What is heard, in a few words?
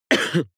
cough male single attenuated soft; koerpergeraeusche